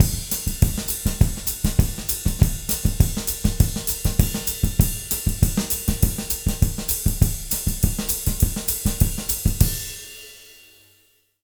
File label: 200 SAMBA, Jazz Latin Drumloops